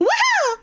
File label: yahoo; birdo; SM64 CO-OP